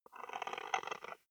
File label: wood board scratch